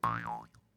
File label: Boing Spring Mouth Harp Downward